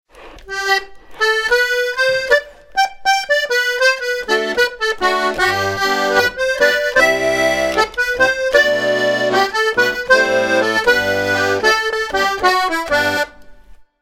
Bobbing Around half Chorus; Morris Hey

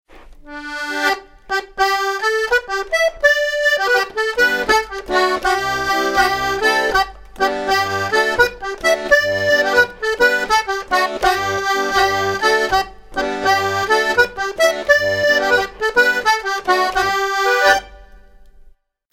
Willow Tree 1x Figure; Morris Hey

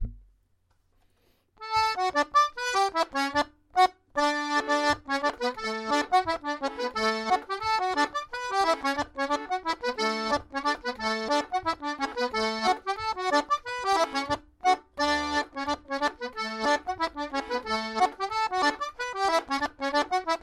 Pigeon On The Gate - normal

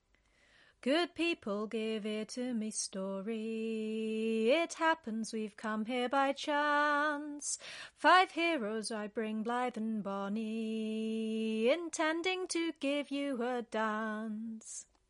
Calling On Song

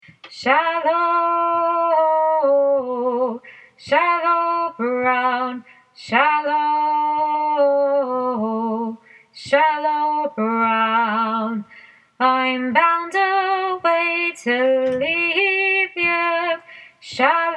Shallow Brown middle part tune

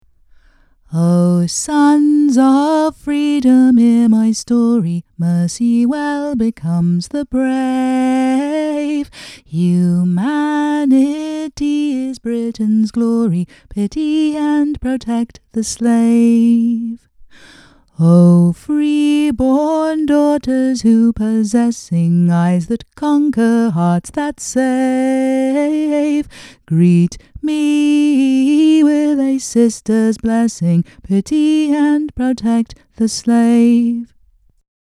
Pity and Protect The Slave LEAD VOCAL; EFDSS

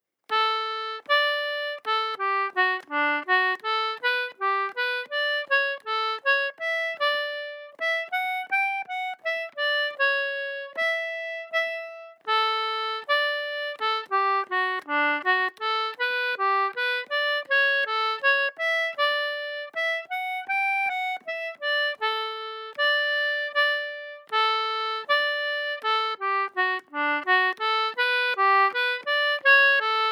slow Morpeth Rant

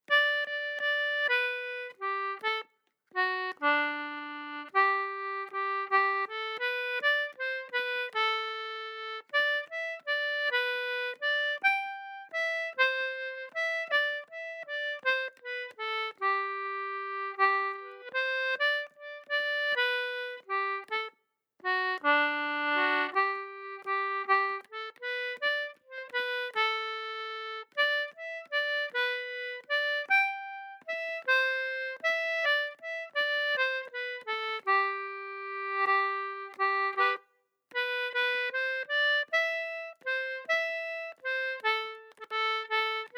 slow Kingsbury Jig

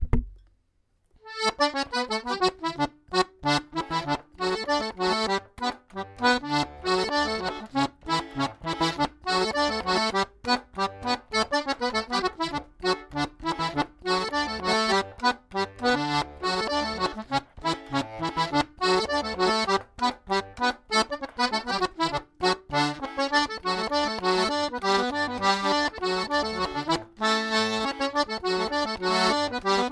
Four Hand Reel - normal

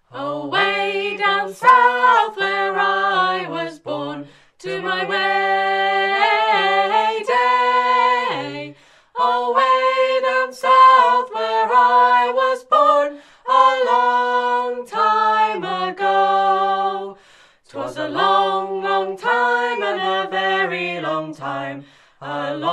unison A Long Time Ago, Acappella

